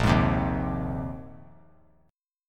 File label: A sharp 9th Suspended 4th A sharp; strummed; JGuitar